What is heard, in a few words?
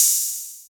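Noise open hi hat; Hi Hats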